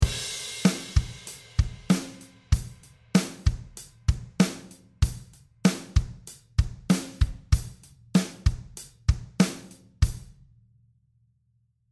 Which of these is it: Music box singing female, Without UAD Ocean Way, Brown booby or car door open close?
Without UAD Ocean Way